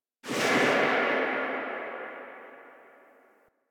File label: Pistol Tail A; Bard